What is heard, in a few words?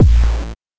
BANK1 BD, NES DPCM; MIDINES